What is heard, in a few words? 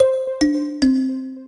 ending